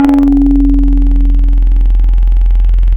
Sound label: StringBass, wavetables; Waves Codex